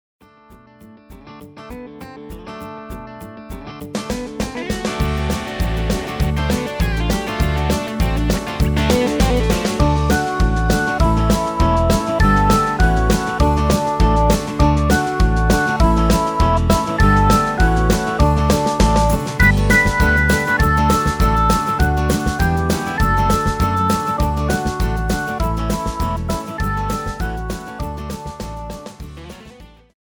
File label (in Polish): wersja instrumentalna